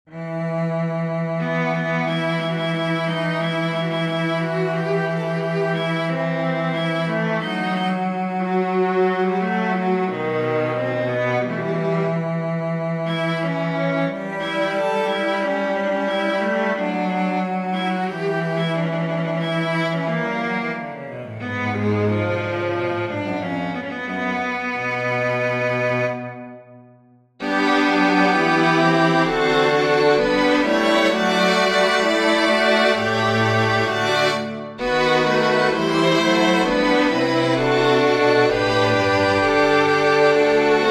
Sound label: chorus for string quartet; bach johann sebastian; brich scha nes morgenlicht